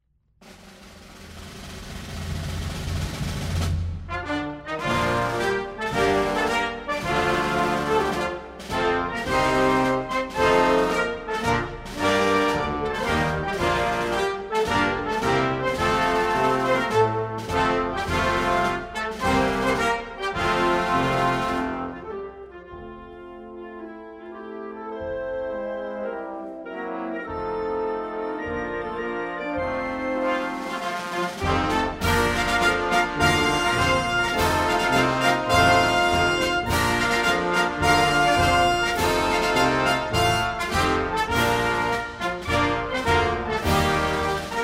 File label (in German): Belgien Hymne; anthem; Belgium